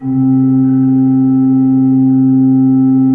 C2, Cathedral Organ; ILIO; Propeller Islands Cathedral Organ

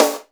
attack rim; E-MU Formula 4000 Series Vol. 2; OrbitPercMaster

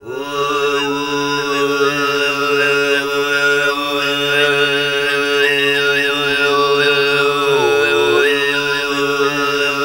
GRP, TUVAN GROUP; Spectrasonics Vocal Planet CD5; World Voices

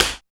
TAP; East West - Phat & Phunky

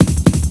Slammin Kick Pickup; Percussion Fills; FX; Sonic Foundry (Sony Creative Software); Futurist Drum & Bass

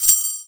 SHAKER, INDIAN PERC

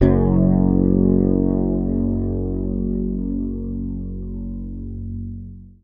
F#, Bass; Sampleheads; Will Lee Bass Library

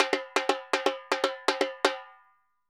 Repique Poly 120, Drums & Percussion; Best Service; Festa Latina VOL-1

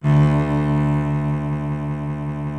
SOLO CELLO C1; Greytsounds VOL-1

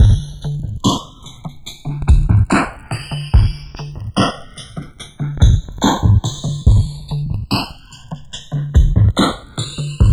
FILTERS, Dark Side Of TripHop; USB Soundscan vol.35; AKAI

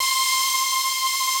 BRUSH SYN, DANCE; Pro-Rec - Synthsonic; Z1 DANCE

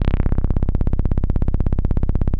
505, String Machines

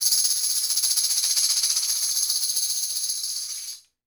TAMBOURINES, Percussion

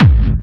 hardbassdrumz, breakcore; Bazzdrumz

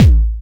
Kick, dry kicks; Quasimidi Rave-o-lution 309

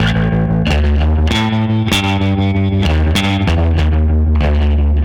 Guitars B, 95bpm, analogue circuit; musicradar

